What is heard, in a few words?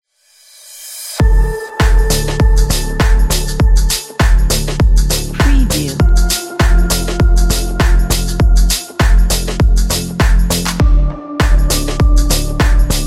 electro technology